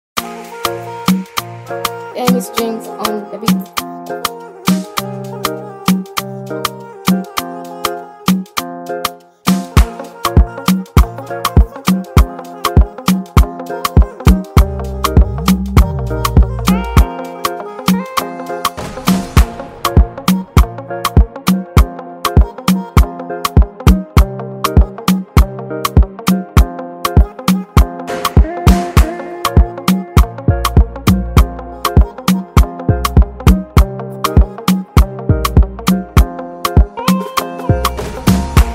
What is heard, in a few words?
Free afro beat, instrumental beat; Heart robber